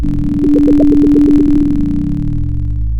pop

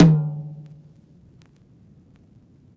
Vinyl Tom

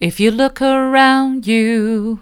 mid, vocal

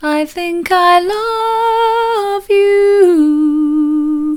soft, vocal

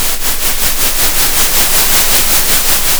center, Sound of a train

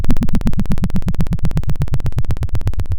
pop, Sound of a helicopter

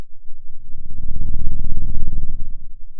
Sound of a dinosaur

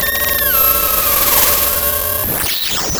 pop, Sound of a cat meowing

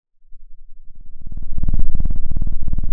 pop, Sound of a cat purring

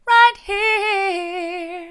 right here mid, vocal

